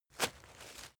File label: step leaves wet single; schritte